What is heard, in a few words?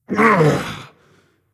nv grunt lift; cernunnos